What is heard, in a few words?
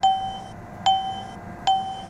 ding; VsDingExtension